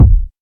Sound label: low bass drum sample g key, Royality free kick single hit tuned to the G note.